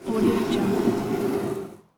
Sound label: heavy wood drag; stormwaveaudio